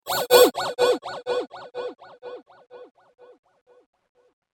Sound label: Twisted Theremin; Miscellaneous Cartoon Sound Effects